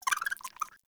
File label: Rat Pet Squeak